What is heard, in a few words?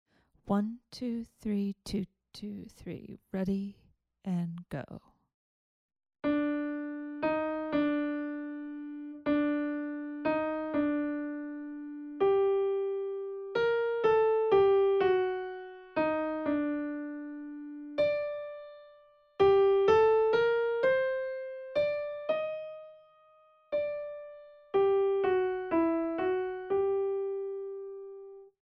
melodic dictation